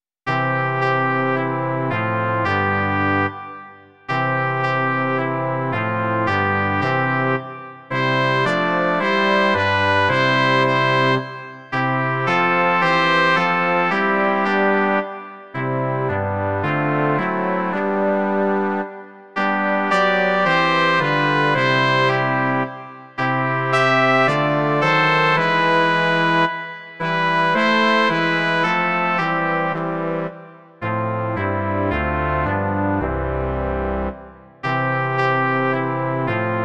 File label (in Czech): žesťový kvartet; Imaginativní verze